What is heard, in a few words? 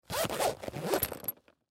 Camera Case Zip Front Pocket, Digital Olympus Camedia; C720; Cameras; Technology; Blastwave